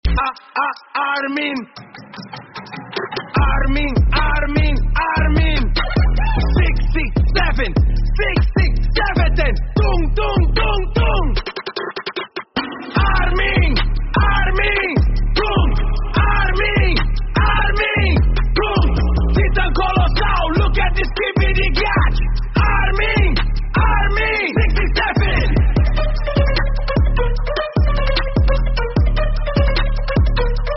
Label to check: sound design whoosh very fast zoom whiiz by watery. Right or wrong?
Wrong